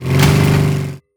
motorcycle hit on rock; anemoi

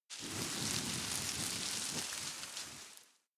fading sparkle; anemoi

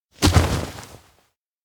fall ground two; awayuki; anemoi

